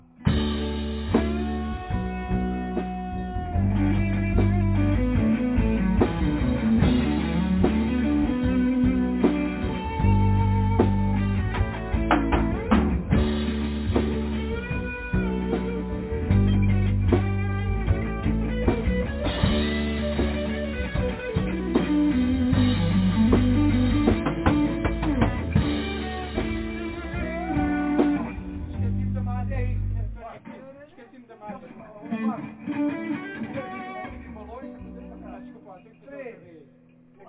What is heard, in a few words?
solo